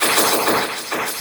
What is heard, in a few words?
3-D MACHINE; Spectrasonic Distorted Reality 1